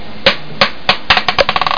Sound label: drop